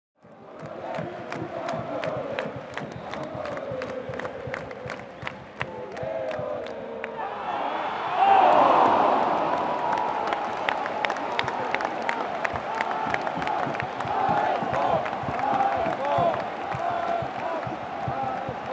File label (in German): Stadion Fan und klatschen